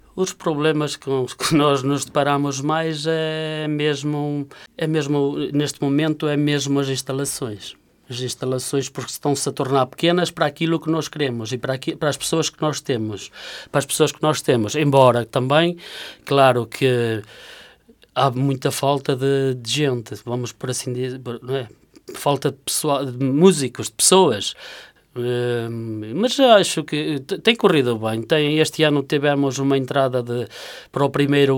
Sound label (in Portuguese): banda filarmonica; Banda Filarmónica do Brinço